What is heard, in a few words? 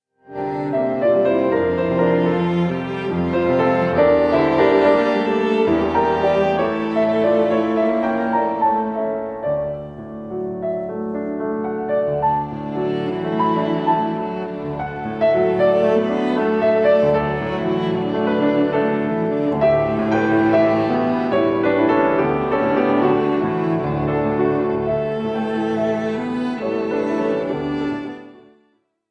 miniatures for violin cello and piano romance andante; frank bridge; set 2